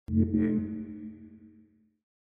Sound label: timer; countdown